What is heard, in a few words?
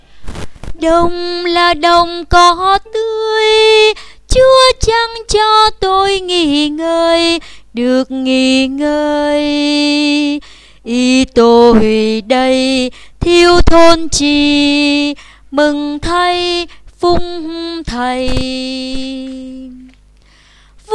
Alto